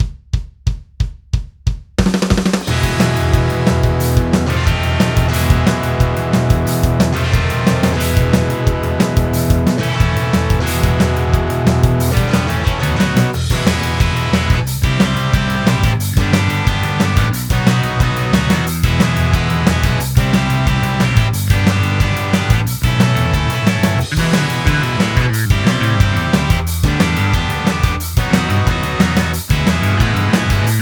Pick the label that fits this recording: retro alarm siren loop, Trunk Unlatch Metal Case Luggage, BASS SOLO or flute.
BASS SOLO